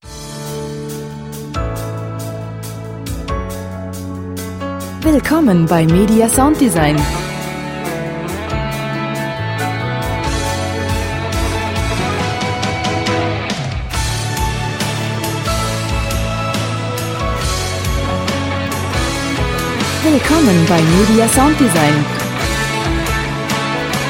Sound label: pop